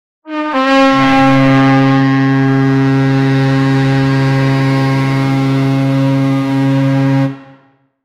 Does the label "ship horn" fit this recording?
Yes